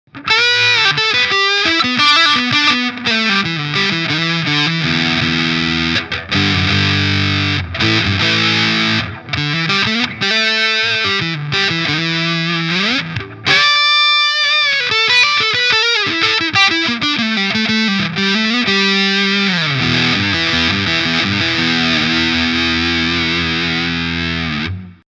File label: Electro-Harmonix Sovtek Big Muff Pi Fuzz Sustain full, mids off